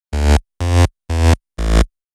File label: bass